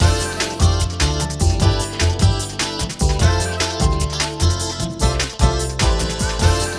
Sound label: Monkey Billiard Win; super monkey ball adventure